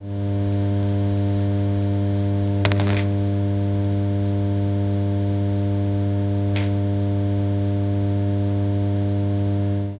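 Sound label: fluorescent bulb flickering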